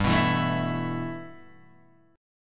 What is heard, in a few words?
G 6th Add 9th G Guitar Open G, chord